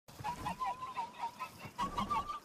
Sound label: Odogaron chattering